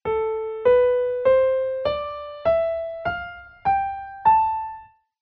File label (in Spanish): escala menor natural